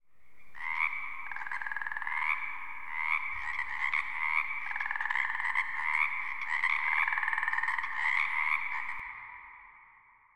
frog cave; ambient

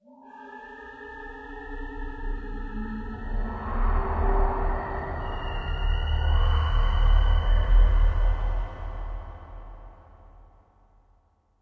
cave, ambient; Minecraft

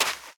sand run, step; Minecraft; Remastered

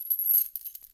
chain, step

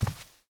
rug walk, step; blocks

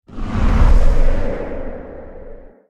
growl, enderdragon; mob; Minecraft

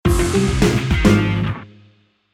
guild rank up; Minecraft

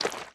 slime run, step; blocks; Minecraft; Remastered

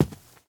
grass run, step

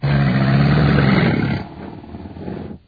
crocodile; ambient

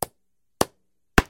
craft hammer; ambient

